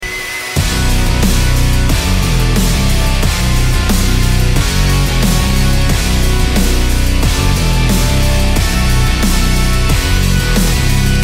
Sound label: titlescreen bgm